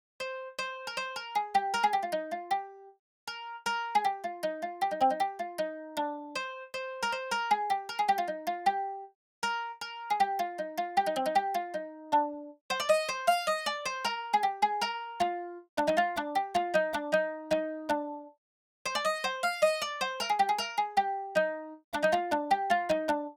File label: Lead Mandolin